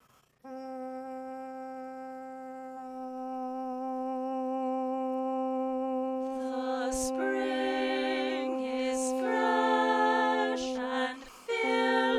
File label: balanced voices; reh track